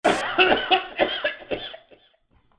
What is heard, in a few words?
hurt